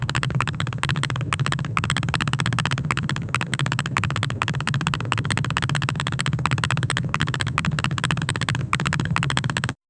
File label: realistic wind sound, air rush